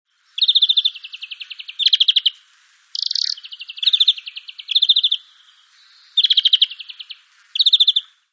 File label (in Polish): dzwoniec - szczebiot; Dzwoniec jest małym, częściowo wędrownym ptakiem z podrodziny łuskaczy (Carduelinae)